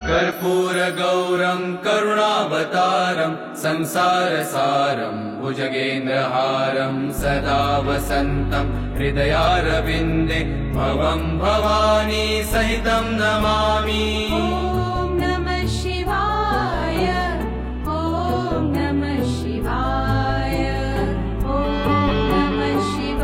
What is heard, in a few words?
om namah shivay